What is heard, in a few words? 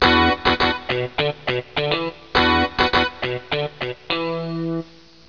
FUNK